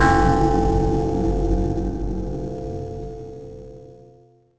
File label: bell; The Elder Scrolls III Morrowind GOTY; XBOX